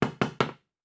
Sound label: Nail; Fantasy Sounds Bundle; Craft